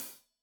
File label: Hat Loose, Hi-Hat, Cymbals Stereo